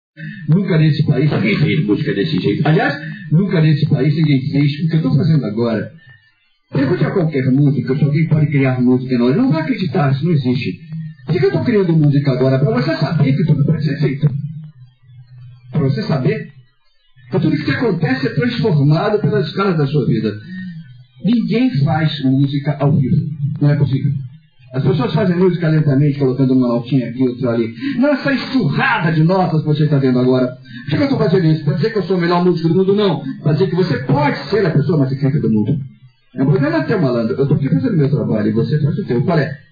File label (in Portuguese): flauta